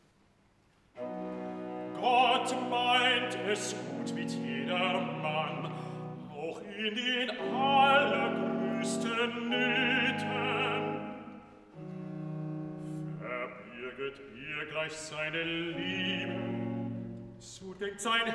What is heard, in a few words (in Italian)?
Cantata, Recitativo (Bass); BWV 188 'Ich habe meine Zuversicht'; Bach; Greenwich; 21st Sun After Trinity